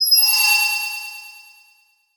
Title Transition Bell; Adobe; Production Element

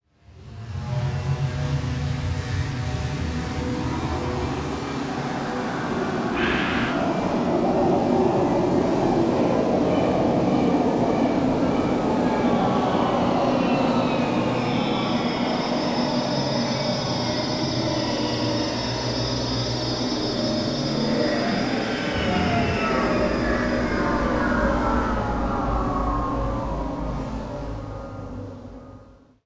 Pitch Drop; Module Production Element Title Imaging; Imaging Elements

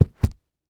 Foley Footstep Work Boots Rug Carpet Single Step; Foley Footsteps

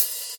Tick Cymbals, Metronome; Audition

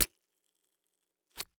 Horror Scary Violence Body Stabbed With Scissors

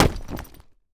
Sports Bag Duffle Bag Drop Cement Floor

